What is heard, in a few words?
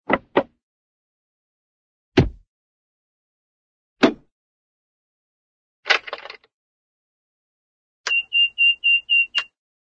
helicopter door open; cartoon